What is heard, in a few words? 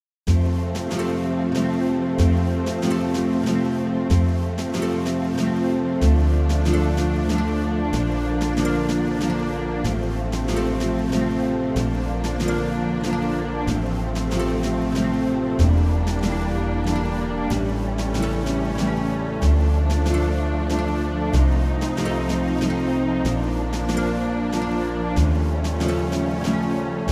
brass minus